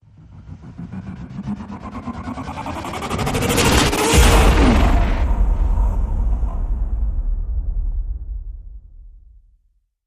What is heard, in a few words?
Hit Death Spiral